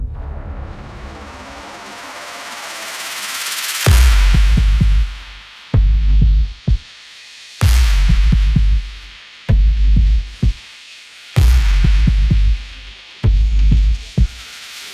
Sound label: electronic dance music; ai